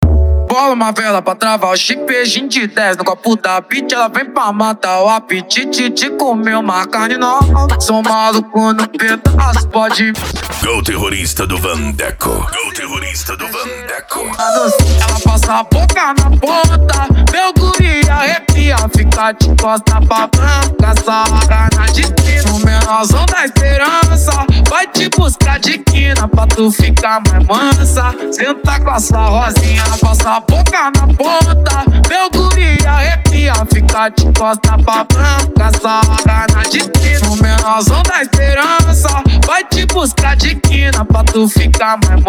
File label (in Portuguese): dance e funk mega bass